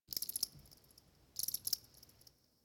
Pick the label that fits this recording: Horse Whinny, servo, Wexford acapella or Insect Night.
Insect Night